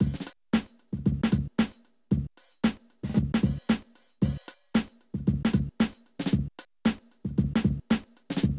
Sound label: Drum loops, Hip-hop